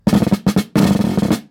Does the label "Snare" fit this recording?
Yes